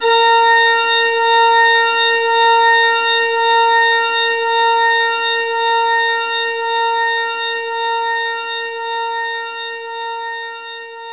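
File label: Pipe Organ G#2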